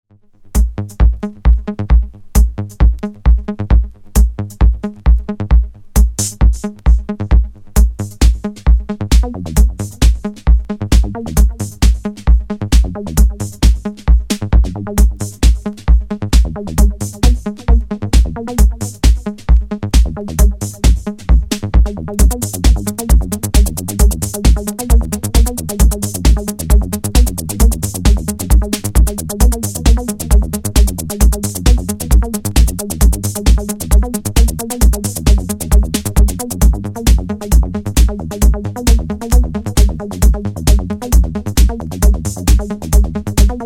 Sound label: Minimal Sync